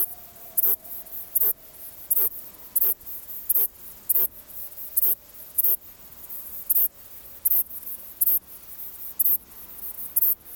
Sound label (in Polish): siodlarka stepowa; zamek Mirow; OrzechowskiR